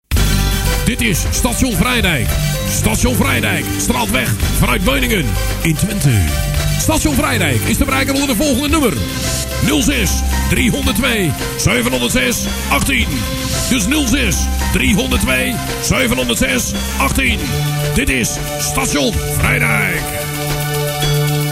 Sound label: jingle; Station Vrijdijk